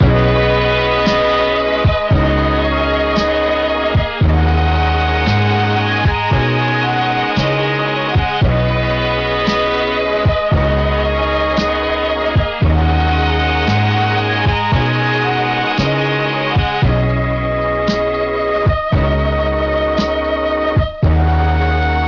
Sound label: Cmin 114bpm 12bit mixed; Hersey Kisses; s950 magic vol 1